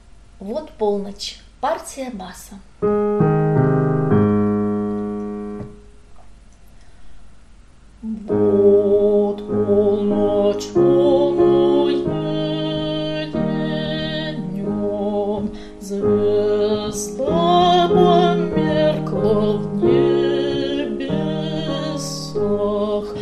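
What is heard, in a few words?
bass, Vot polnoch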